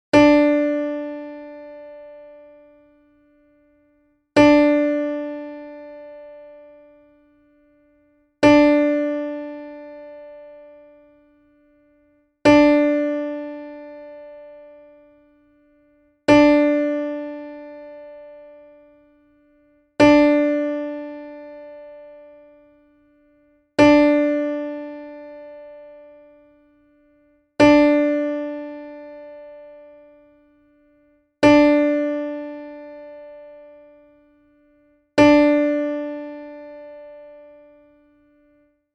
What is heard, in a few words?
Mando D